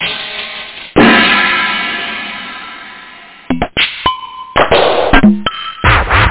HiH; Drum Kit 5

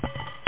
bolder; grimlor

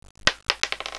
dice; Monica

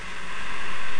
sshpt

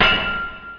SHELL HIT; GoD

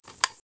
lamp; workshop

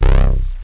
Synth Ba; Sound Library 2000; Wayzata Technology

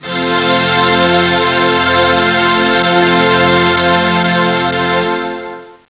End Chord F2; Star Trek JR Audio

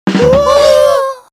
Jingle; ARRIBA

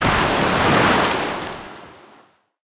Cannon Enemy Miss; Pirates Of Treasure Island

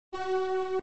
Program Error; Desktop Studio 2000; Places

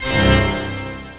full pipe organ; OctaMED SoundStudio V1; mirage

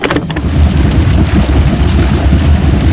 FUELON

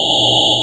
Blade; Big bang

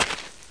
player dirt

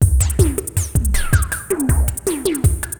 DRUM LOOP ambient 160

